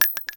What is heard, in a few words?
shell 39mm default; STALKER; IWP